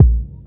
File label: tight bass drum sound a sharp key, Royality free kick drum one shot tuned to the A# note; kick drum samples